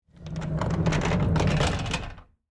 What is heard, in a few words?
Industry, Cart, Roll, By, Wood, Surface; Rolling; Objects; BlastWave